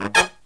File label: LOW CHORD, Killer Brass Riffs; USB Soundscan vol.29; AKAI